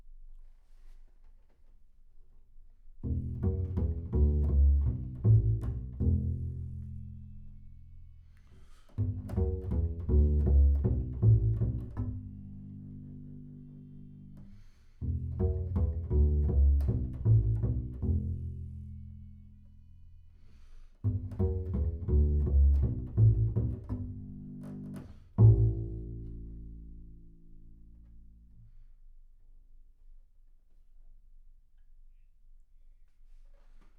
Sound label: Bass Room